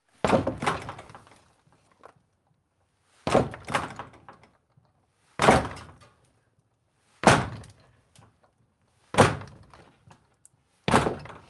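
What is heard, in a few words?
kicking wood door rattle, interior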